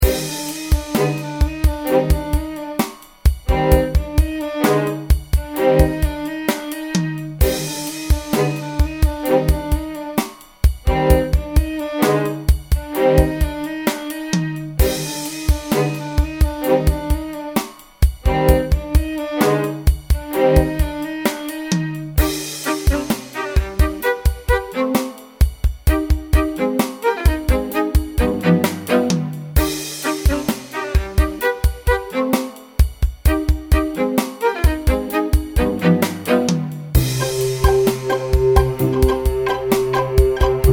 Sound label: beat